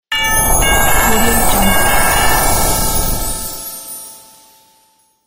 christmas whoosh transition

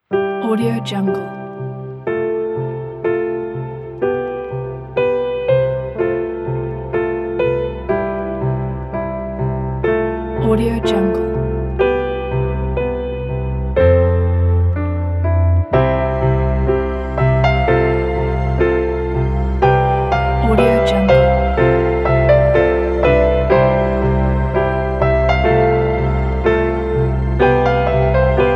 inspiring piano; wavelayers

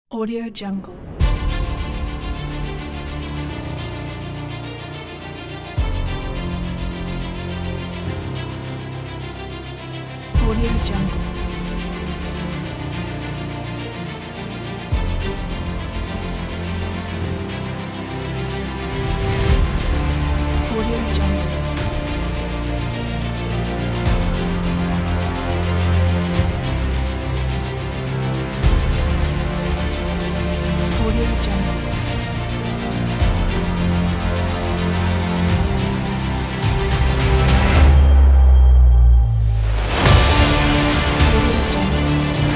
the epic; vladimiirz

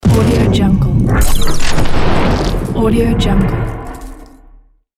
scifi loading device

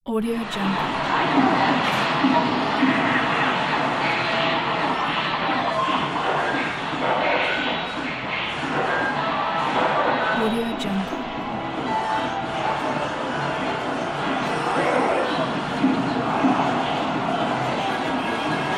shopping center

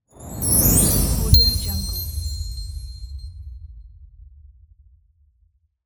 baseball glove catch

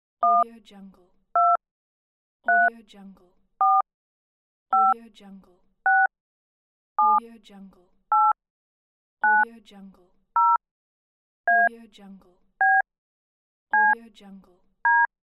dtmf tones

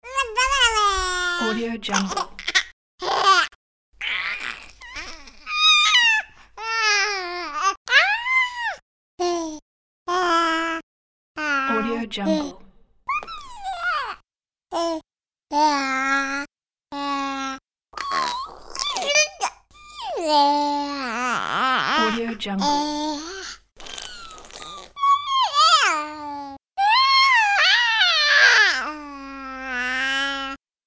baby

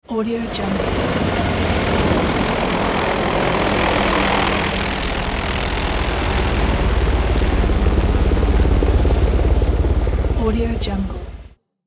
helicopter

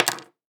object set down; blast drive 30; prosoundfx

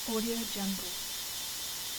scifi mining small beam loop

